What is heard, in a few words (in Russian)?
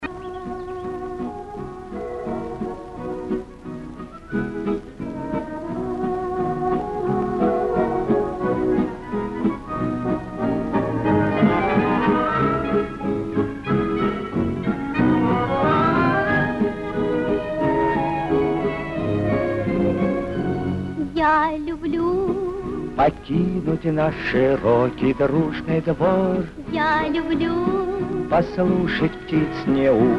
vesel duet